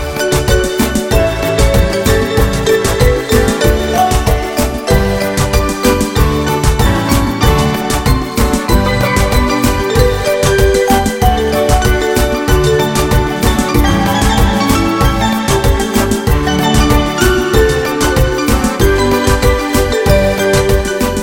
Wonderful Dancing Music

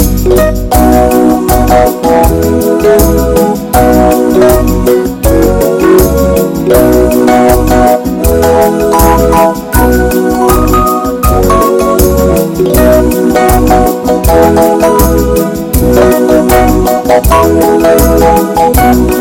Great Happy Song

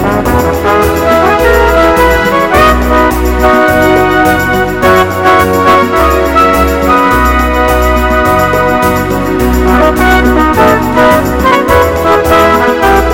Slow and Smooth

